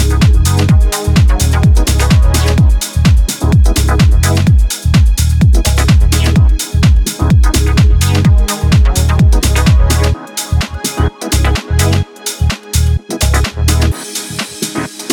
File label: low light groove